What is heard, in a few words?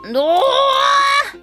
polka